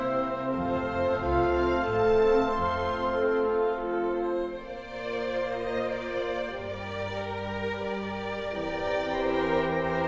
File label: classical